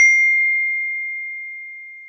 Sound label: notification; iphone 13